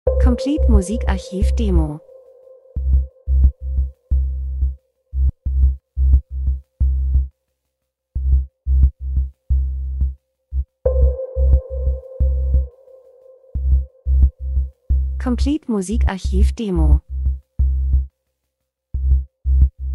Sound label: drums; Elektro Rhythmus U-Boot Spannung Bass Gleichförmig Monoton Akzente Unterwasser Suche Spielfilm TV